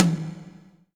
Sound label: acoustic tom drum one shot d sharp key, tom drum single hit tuned to the D# note